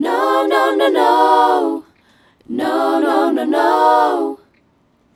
Female Vocal

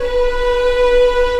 ANGELIC B3

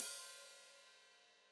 Hat Foot Open, Hi-Hat; Deeply Sampled Drums

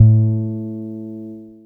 DUB BASS, Electric Basses; PlatinumPhattCD2; E-MU Producer Series Vol. 8 – Platinum Phatt

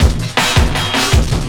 Drum & Bass, 160 BPM

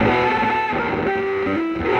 Fierce, Electric Guitar

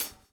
DOWN, DOWN KIT 78; AMG Gota Yashiki Groove Activator

